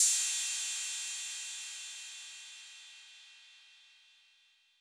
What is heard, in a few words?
Cymbal 808 Decay, Clean Digital